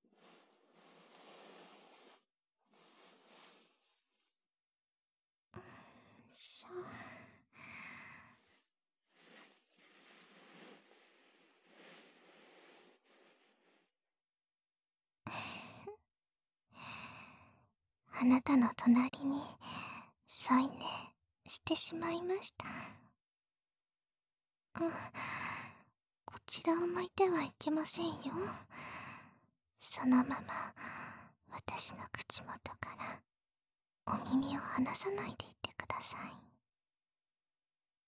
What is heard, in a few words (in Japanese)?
耳奥舐め＆耳奥ピストン舐め, ASMR